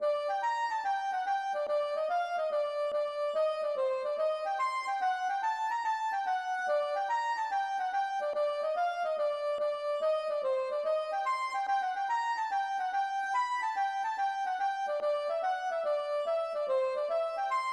gavotte; Kendirvi

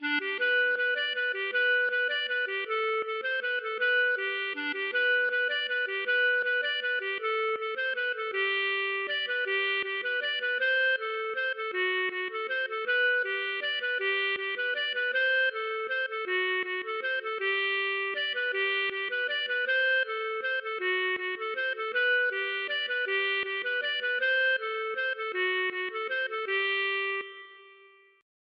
mazurka; Mazurka de Lapleau

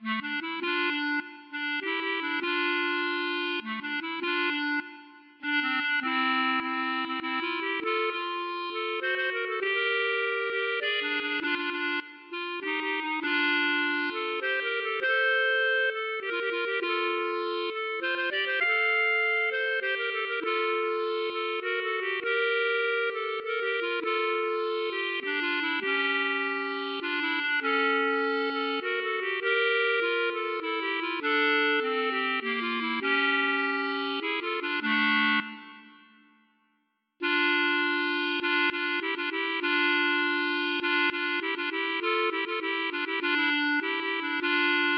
klezmer

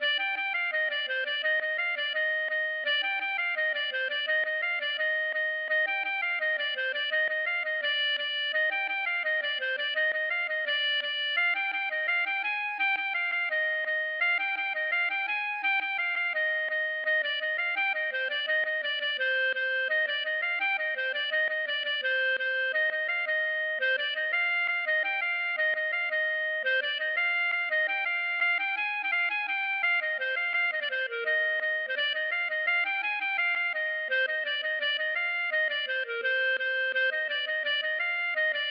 gavotte; Suite Nolose 1

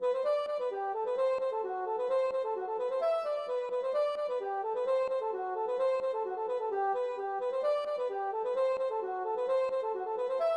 polka

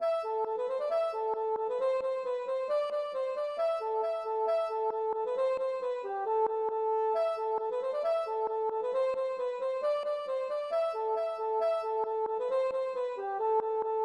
polka

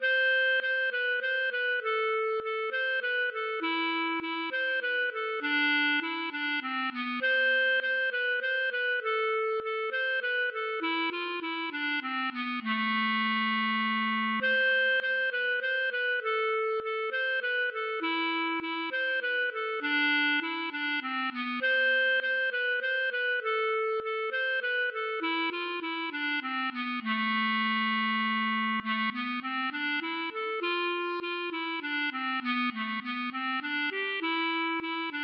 mazurka

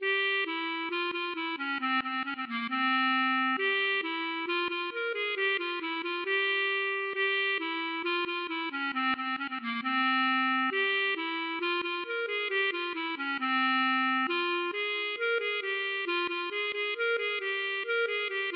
klezmer

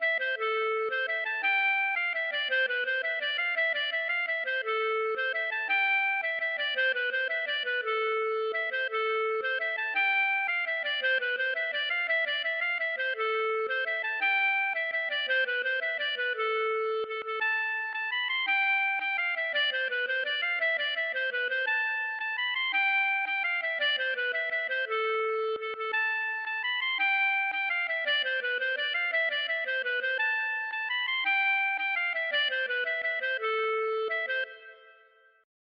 mazurka

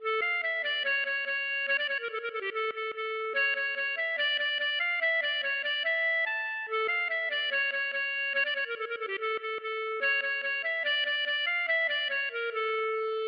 klezmer

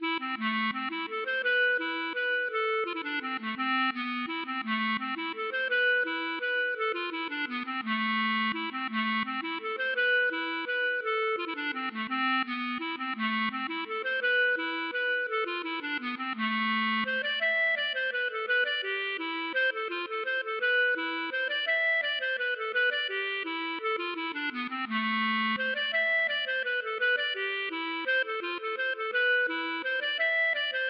mazurka

